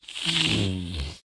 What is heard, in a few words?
zombie pig angry; mob; Minecraft; Conquest Default